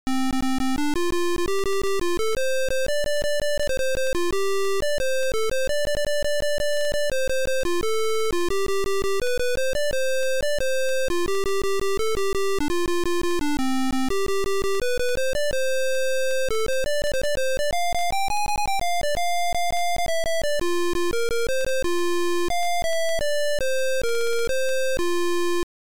Title Beeper; The Fall Guy

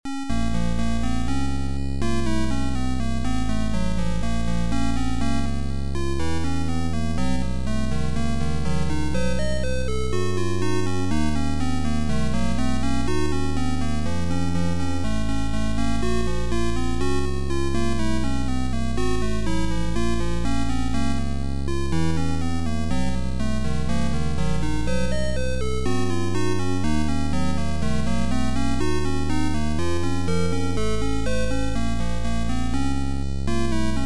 Game Over; Stronghold

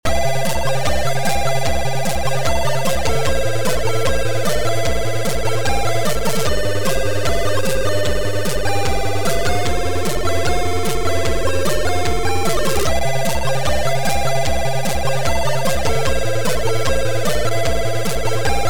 Amiga Chiptune

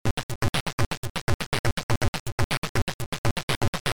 Beat

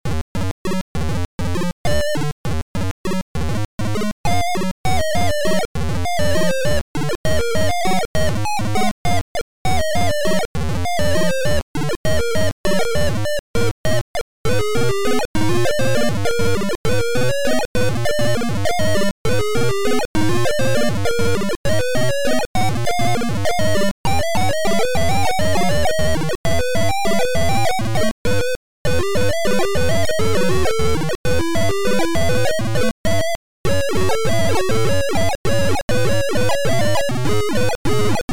gameplay; OldTower; for Vector 06C

